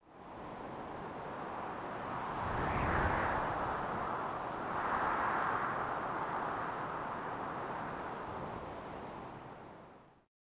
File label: theed Wind Gust; Star Wars Obi Wan; XBOX